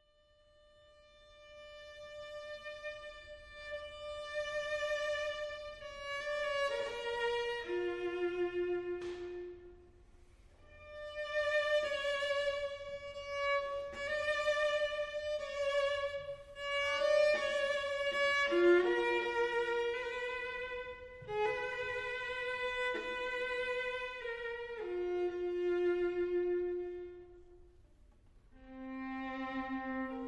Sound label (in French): Quatuor à cordes; Frank Martin